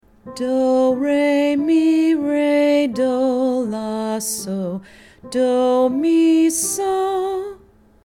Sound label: Centering Song